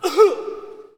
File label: Hockey Male Hurt; SFX Kit; Hockey Vocal Sounds